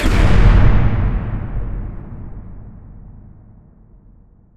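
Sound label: explosion distant medium; Source Engine; Portal 2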